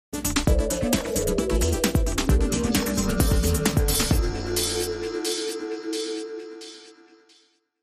Sting

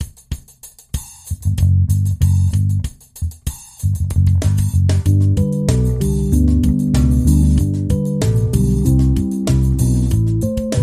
Jingle Bell Phone; Trad (PD)

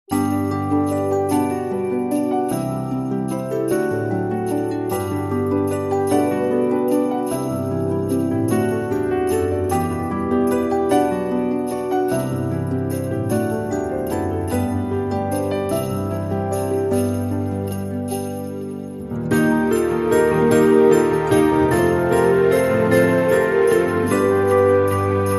Lullaby

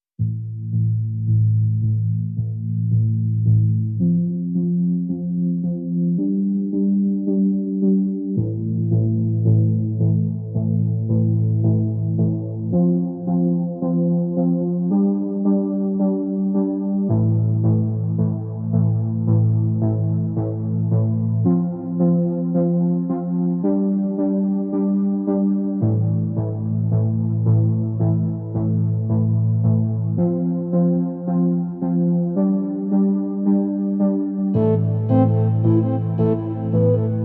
Chaotic, Hook

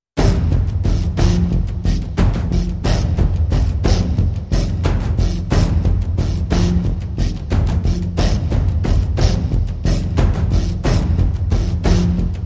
Perc And Pulses Only

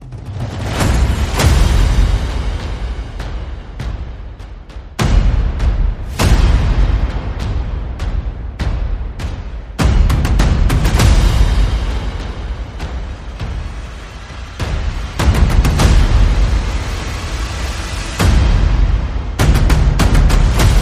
Percussion and Effects

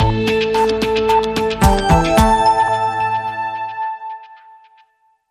Logo Only Alt

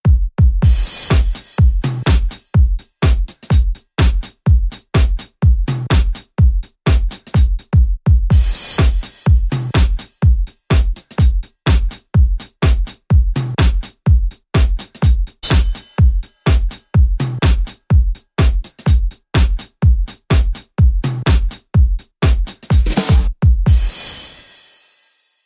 Control Beat

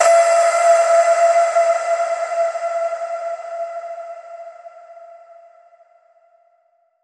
Expanse Distant Ping

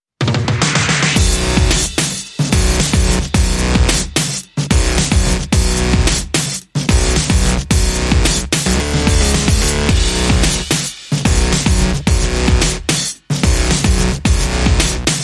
Rhythm

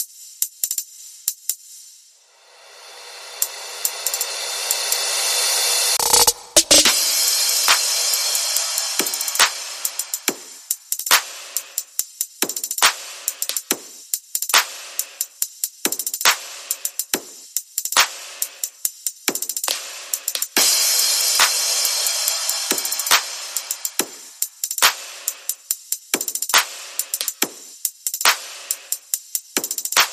Perc and FX Only

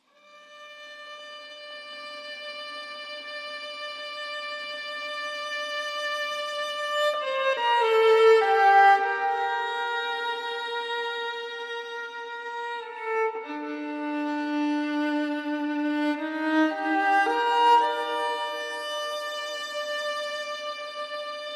Only Violin

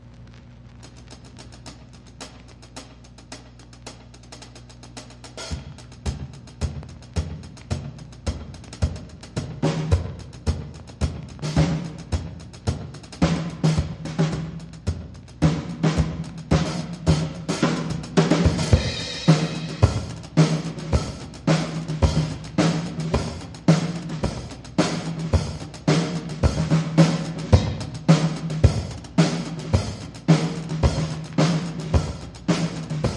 Drums

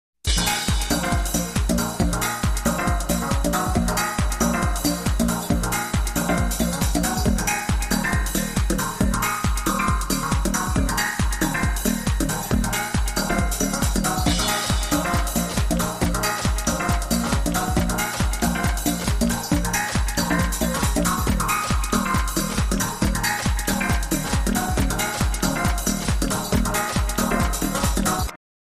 Elegant Techno

